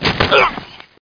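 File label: tackle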